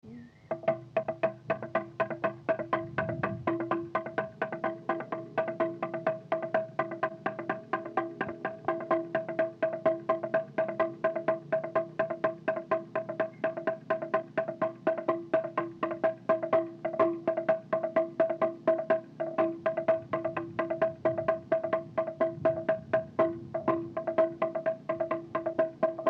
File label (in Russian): Наигрыш на барабанке; folklore